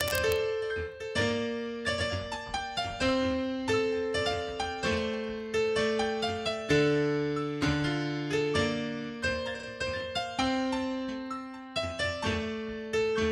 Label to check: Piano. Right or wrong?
Right